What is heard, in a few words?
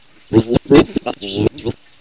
pain; Alien Arena; droideka